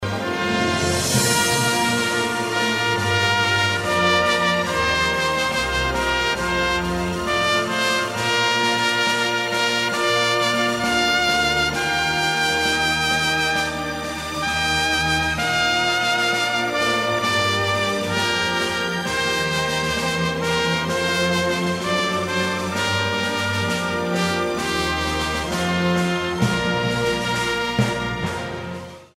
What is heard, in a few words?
trompet; skuld gammel venskab